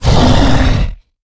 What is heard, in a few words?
Pain Light; Evolve; Goliath